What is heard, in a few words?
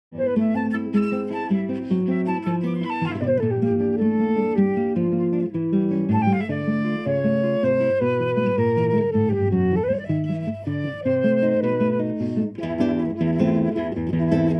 flute-guitar dry room